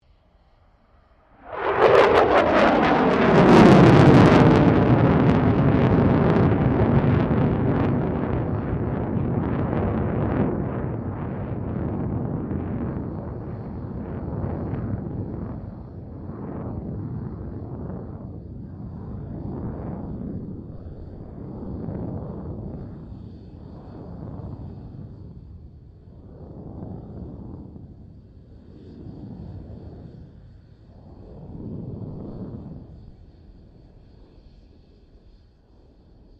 AIRCRAFT F16 FALCON MANEAUVERING

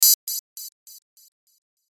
BUTTON DIGITAL BLEEP, Glitch, Reverb; Production Element, Imaging Element, Accent, Transition; Multimedia

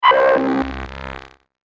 Dying Laser Data, laser sound; science fiction sound effect; Andrew Fleming

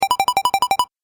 Game App Collect; Video Game Sound Effects

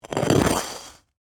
Ice Object Drag, Across, Ice; Texture; Dragging Sound Effects; BlastWave

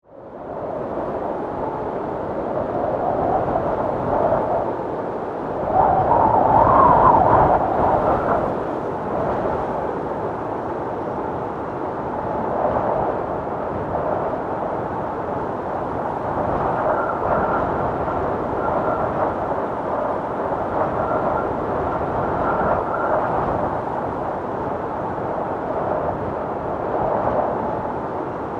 Wind Storm, Weather, Wind, Storm; BlastWave

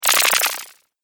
Alien Wet Element; Alien Sound Effects

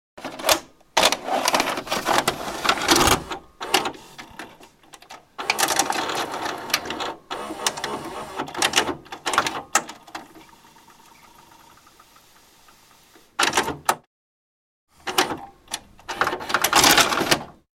Video Cassette; Mechanical whirr and clunking of a Video cassette recorder rewinding and fast forwarding.; Tape Player

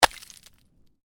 Ice: small chunk of ice lands on hard surface; Snow; Stuart Duffield

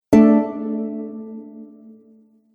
Harp Surprised Chord; Musical

